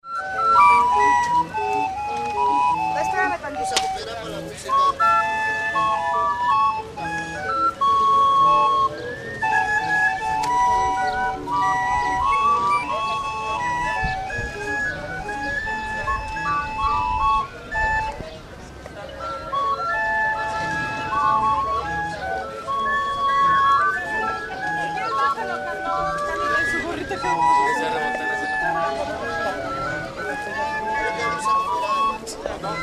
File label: grinders